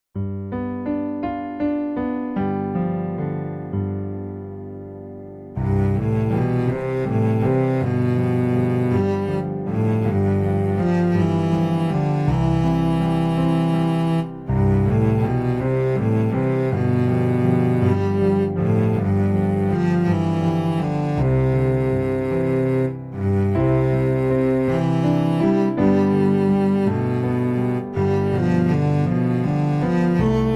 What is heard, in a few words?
cello